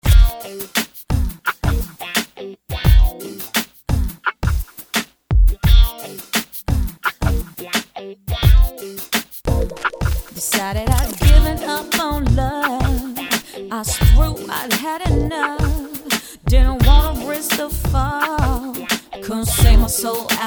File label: Neo Soul R&B